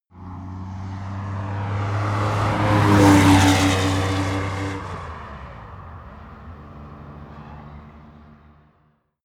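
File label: sports car exterior porsche rs drive by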